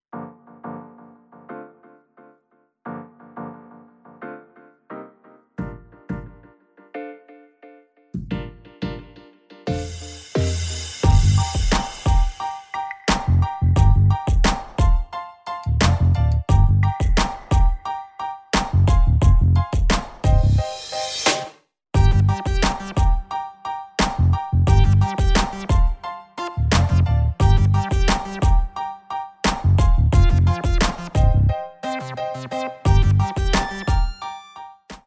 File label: Playalong HipHop